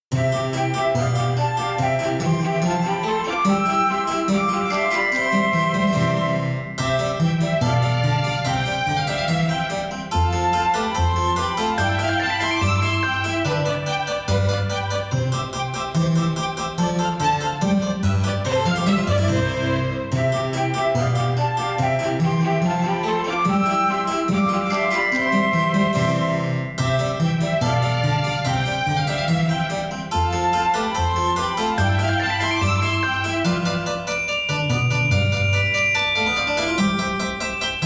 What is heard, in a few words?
Accompaniment Backing Tracks